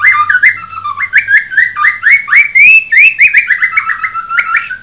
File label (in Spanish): ave